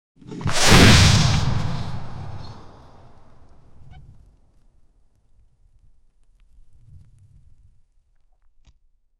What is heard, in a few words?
tiger springing across a